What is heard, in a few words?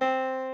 piano c3